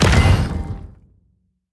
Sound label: wrecking ball; heroes torres